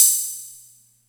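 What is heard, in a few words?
Atlantex Music Percussion Computer hi-hat open; Atlantex MPC-1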